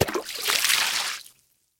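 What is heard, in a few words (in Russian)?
короткий хлюпкий звук хлюпанья воды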